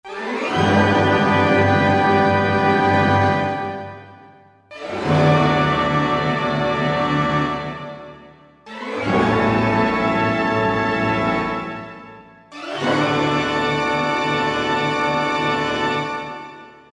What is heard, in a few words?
Orch Gliss Maj